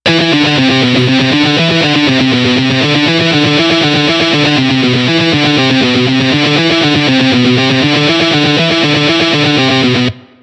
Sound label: double picking example, tremolo picking